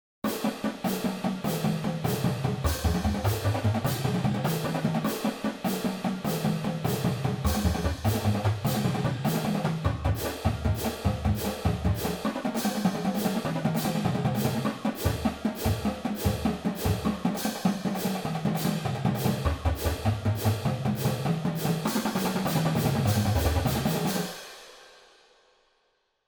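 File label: groove, drum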